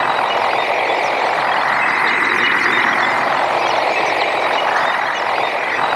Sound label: WHITE; AMG - Vince Clarke Lucky Bastard